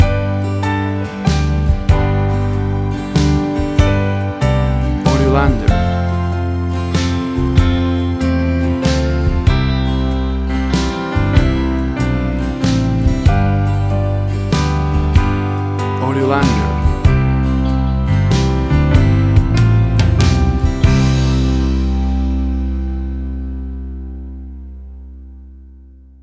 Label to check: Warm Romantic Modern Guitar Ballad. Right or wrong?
Right